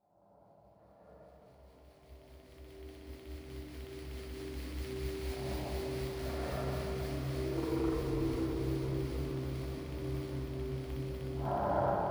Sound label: World Ambience; factorio